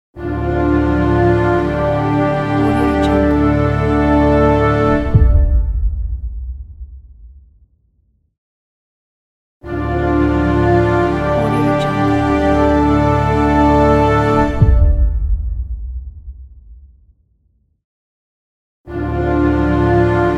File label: mission failed